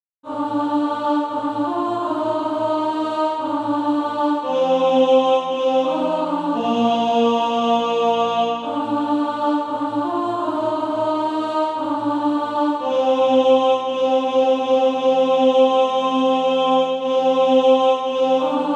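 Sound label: alto, SATB Practise Music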